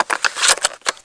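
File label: pickup, weapons; SINDEMO; ammo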